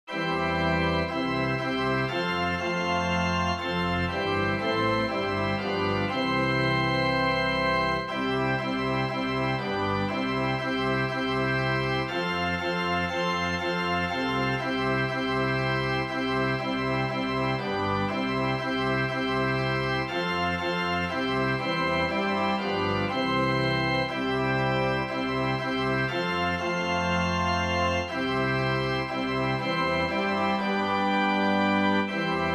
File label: organ; Jesus Loves Me